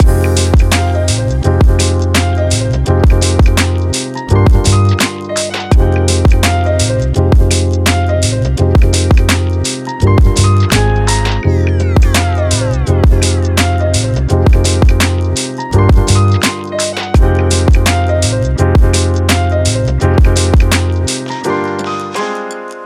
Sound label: mellow travel vlog